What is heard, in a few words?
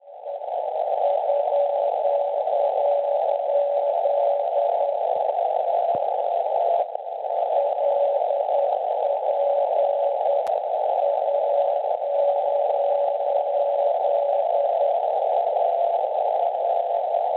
NDB Cholet Francia 357 kHz; LP; Schwarzsee